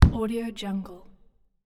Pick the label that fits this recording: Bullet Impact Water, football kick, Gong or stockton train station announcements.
football kick